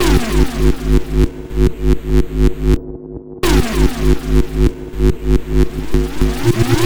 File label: Synth Fm, 140 BPM; loops; CHIPSHOP DELUXE